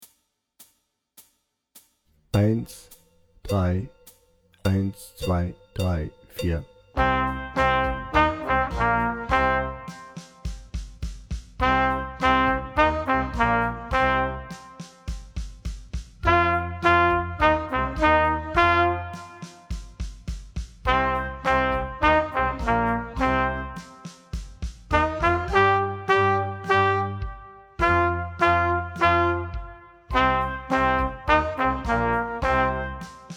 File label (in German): Hard Rock Blues Trompete lauter langsamer